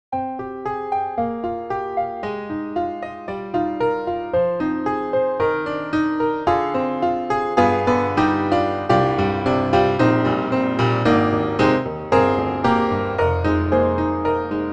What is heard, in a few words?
Baby Grand On Hard, piano; IK Multimedia